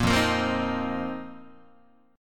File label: F 7th Suspended 4th F down Guitar; strummed